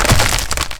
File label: Ice crack large